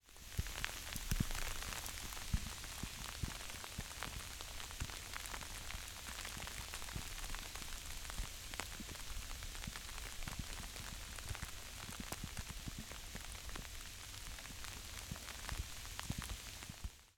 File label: Snow Sleet On Pin Oak